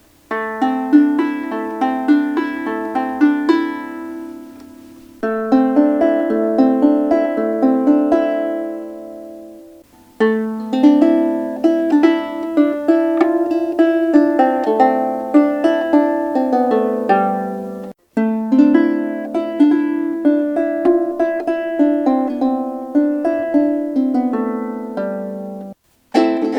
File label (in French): lyre chevalet chene special vs ambre